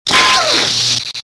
sentry pain

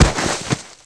foley slide, skater; Tony Hawk's Project 8; XBOX

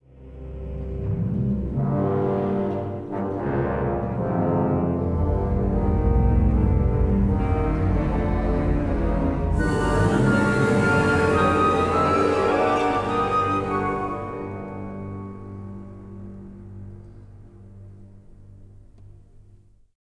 tension, music

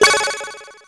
Ring Of Fire; Finding Nemo; XBOX

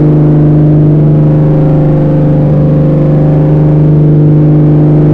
engine; Totaled; XBOX

